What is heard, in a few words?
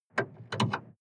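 sports car exterior bonnet handling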